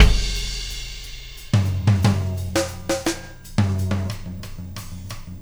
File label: Beat, Loops